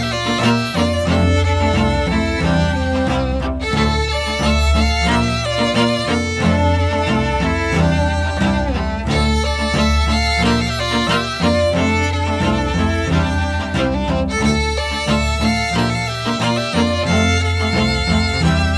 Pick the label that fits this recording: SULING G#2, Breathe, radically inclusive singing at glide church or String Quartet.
String Quartet